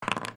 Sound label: Dice, ludo game; python